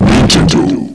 logo; Nintendo; GAMECUBE; LUIGISMANSION

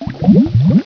Underwater; PC; MARIOSFUNDAMENTALS

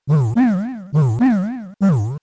enemy chained kong attack; SNES; SMRPG

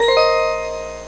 shine select; GAMECUBE; SUPERMARIOSUNSHINE

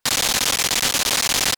enemy bee attack; SNES; SMRPG

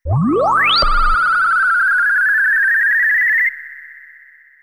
enemy bubble; SNES; SMRPG

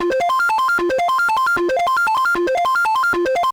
item reel; SNES; SMK

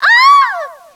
eyahhh; DS; ML BOWSERSINSIDE; Peach

